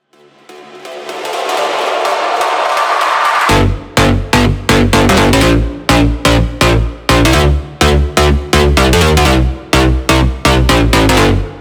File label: futurehouse main bass